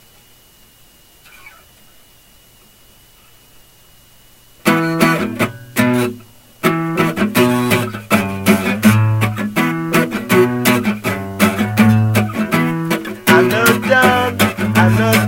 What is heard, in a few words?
Acoustic Session; Down